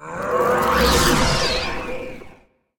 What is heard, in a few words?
birds of wisdom activate; odin; smite